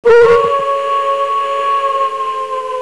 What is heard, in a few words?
howl